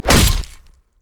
basic attack knee; rengar

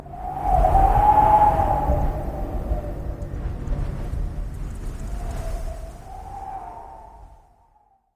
heavy wind cast

